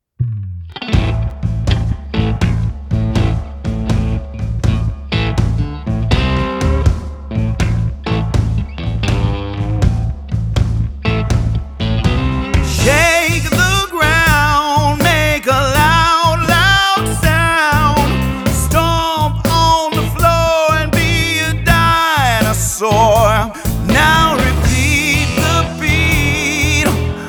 Dino Feet Beat

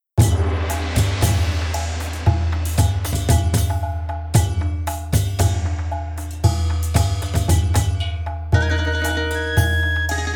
Chinese Ribbon Dance

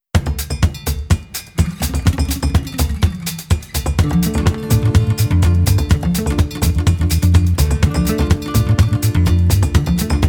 Hyena Chase Instrumental

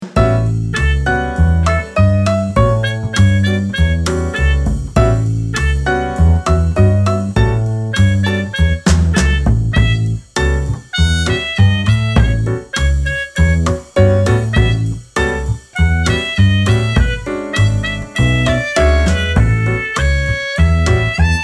Head Shoulders Knees and Toes Instrumental

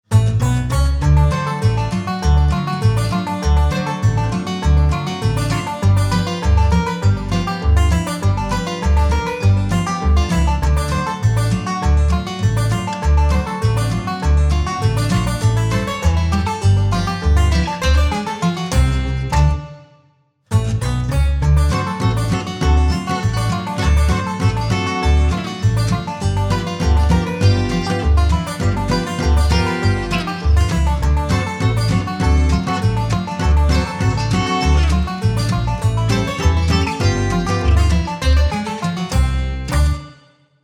Bluegrass Baby

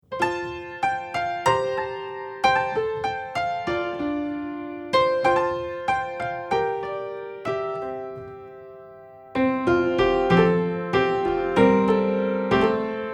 Purple Piano Instrumental

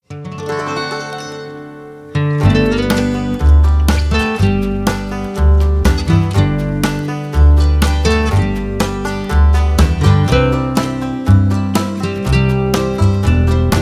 Bee Buzz

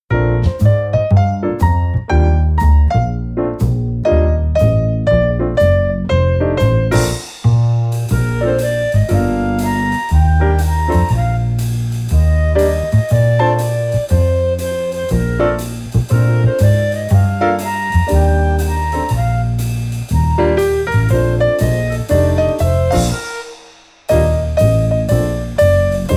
I'm a Little Teapot instrumental

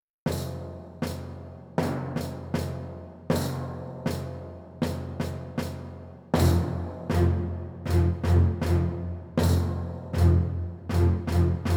Reuse Rhythm

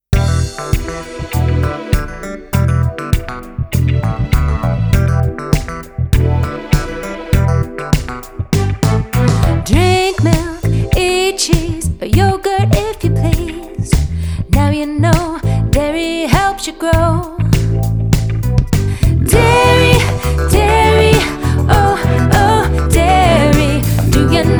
Diary Disco Dance

